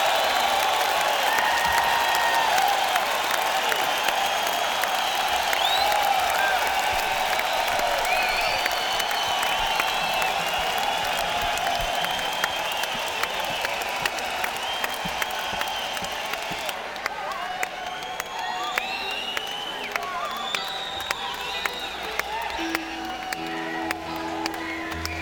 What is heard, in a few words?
Encore Break; sony ecm270